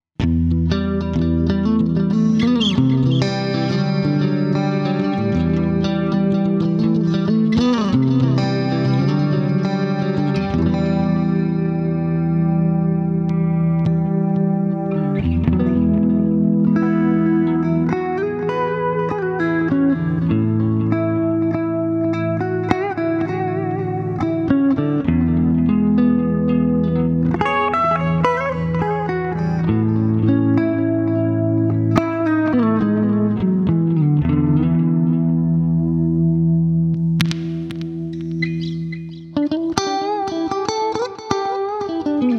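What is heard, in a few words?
Ibanez QX54QM Clean with Effects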